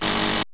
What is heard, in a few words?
IDLE, robots